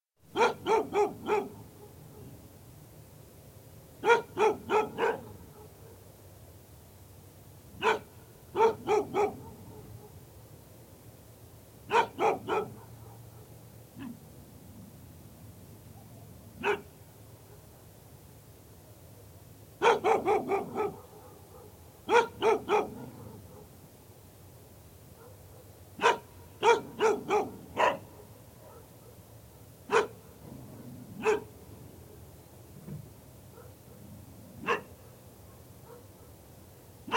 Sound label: dog; Zomboid 41.78.16; Project Zomboid